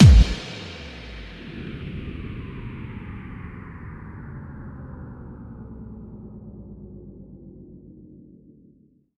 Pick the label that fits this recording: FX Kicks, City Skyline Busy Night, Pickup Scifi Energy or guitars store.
FX Kicks